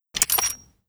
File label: bullets out, python